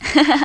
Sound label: Laugh; Epson